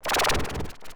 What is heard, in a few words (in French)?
Bruit Oscillant